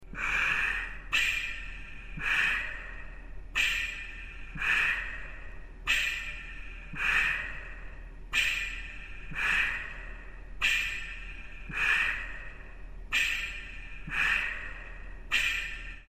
Respirator Pump

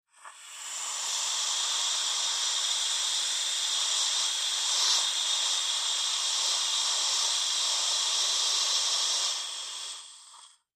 Steady Air Hiss Rises

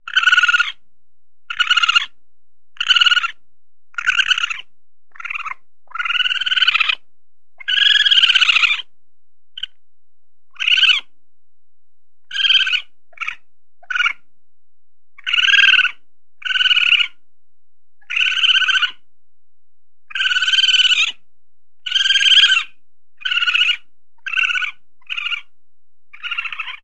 Raccoon Chitters, raccoons

